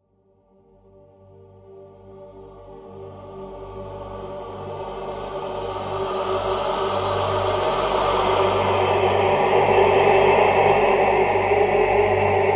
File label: Music Trans M Choir, choirs